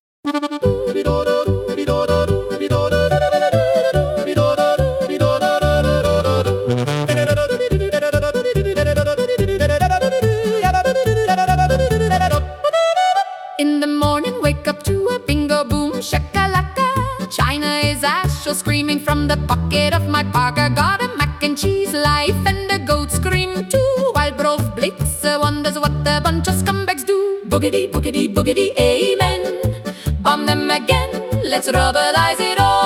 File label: Yodel Jingles